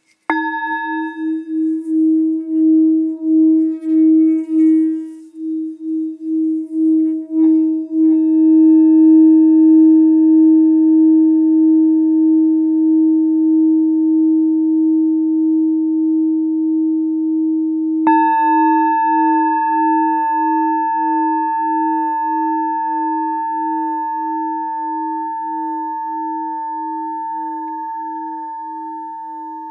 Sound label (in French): Bengale la diese